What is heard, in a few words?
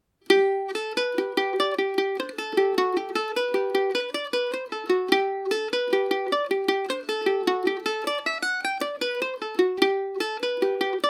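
humours of ballingarry g major, mandolin; Paris Swing Macaferri mandolin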